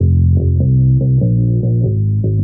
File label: bass